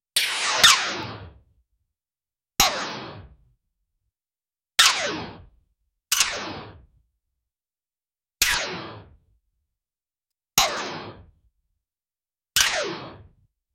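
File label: magic ball going towards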